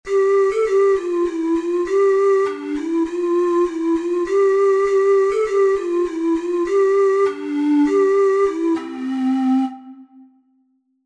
london bridge, ocarina four hole

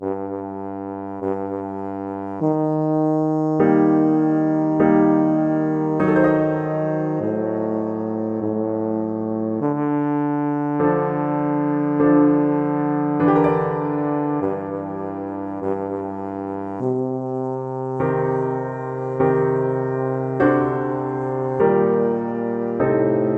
sonata, tuba

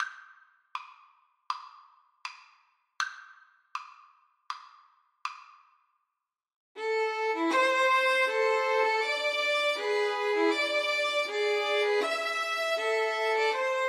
confutatis, Violin Trio

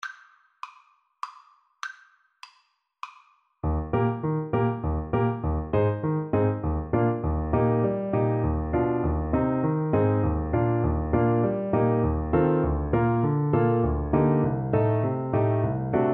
kleine hochzeits kantate woo 16 trumpet; Brahms, Johannes - Kleine Hochzeits-Kantate, WoO 16

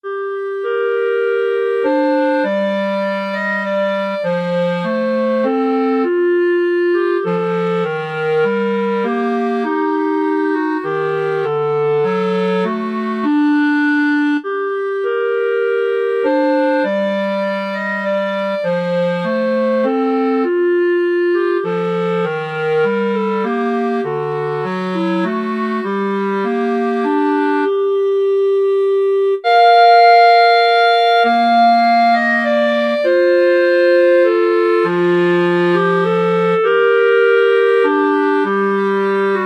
clarinet duet

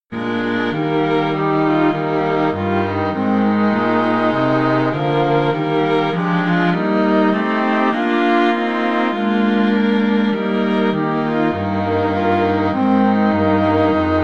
string quartet

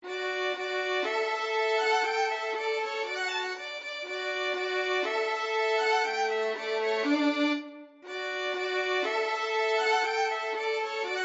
Mozart violin duet

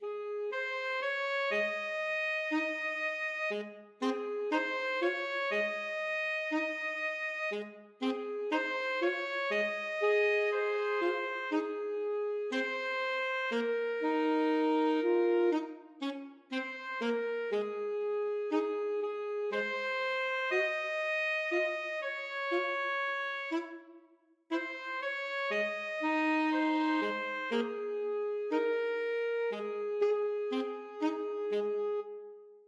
alto saxophone; when the saints

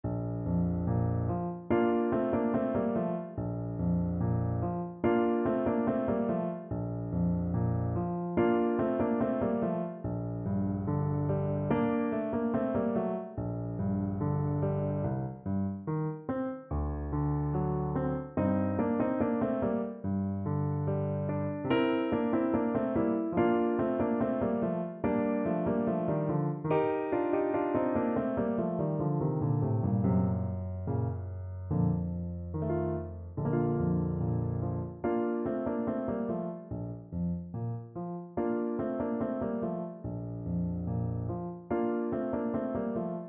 trombone

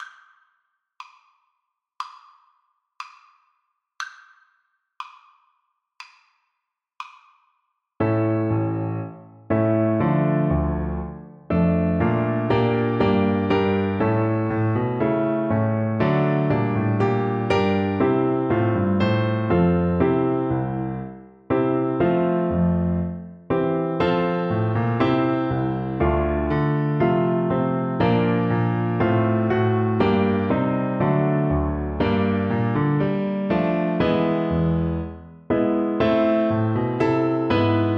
Oboe

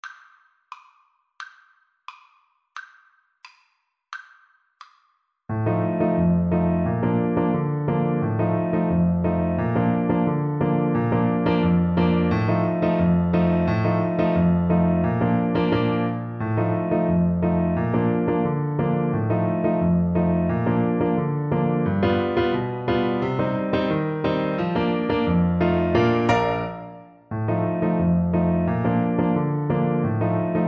French Horn

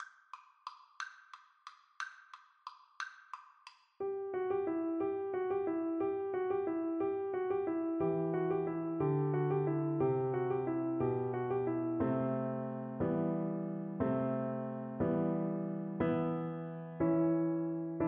Piano Duet

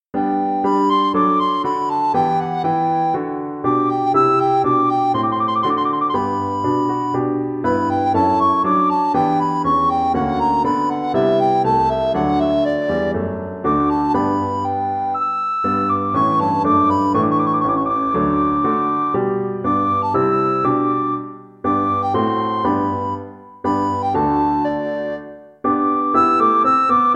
sonata, recorder

